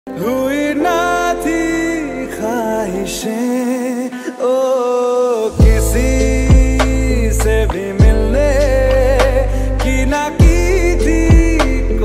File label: lofi slow reverb